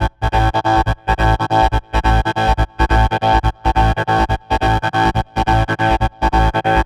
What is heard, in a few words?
140 House Arp Amin; Dance; DJ; Synth; Arps; Pro Tools Essential Loops; Digidesign